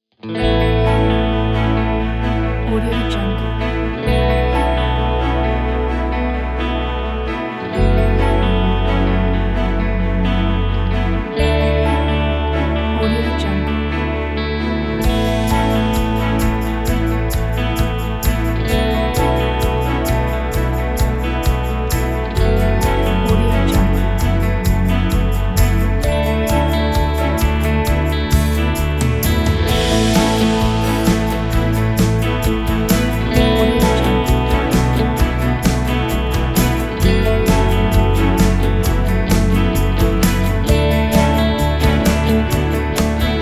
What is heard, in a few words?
sensational review motivational corporate strings